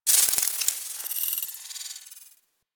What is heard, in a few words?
Tech Movement; Ambience; Warframe; Corpus Railjack